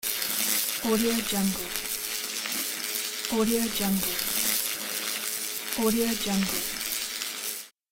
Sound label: electric arc